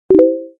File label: Message Pling